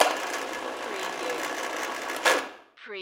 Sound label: Chain Crain Type